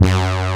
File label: SYNTHESIZER ANA BRASS G1; AKAI S6000 CD-ROM - Volume 1